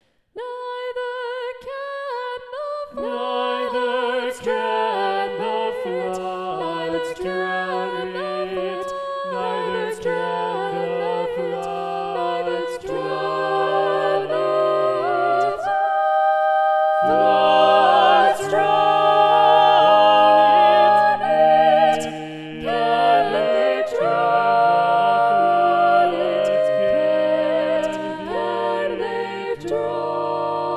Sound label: SATB Tenor; Tenor 2 Muted; honor choirs; National-2023